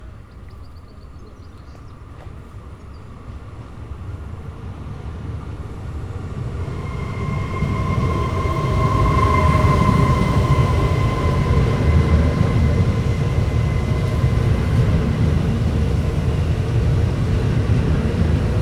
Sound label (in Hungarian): megérkező szerelvény